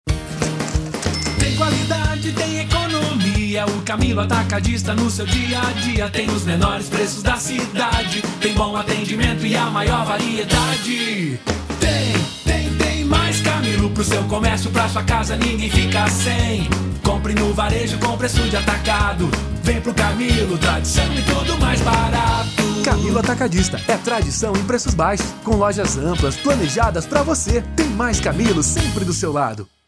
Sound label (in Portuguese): Jingle